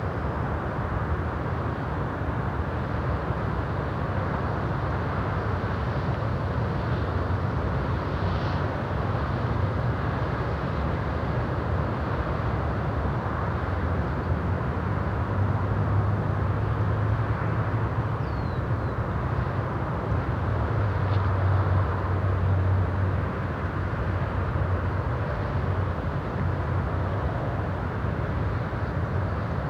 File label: Amb Air Loop; Rampage